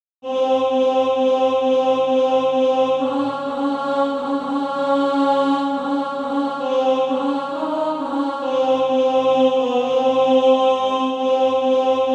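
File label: alto, SATB practise music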